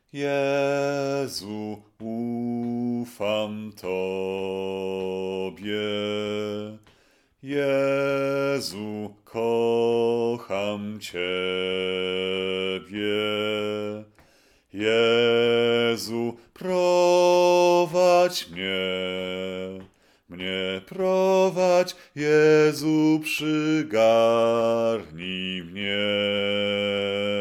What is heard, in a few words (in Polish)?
bas; Jezu ufam Tobie kocham Ciebie